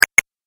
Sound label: 8 Bit Style Switch; Video Game Sound Effects